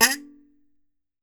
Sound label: KALIMBA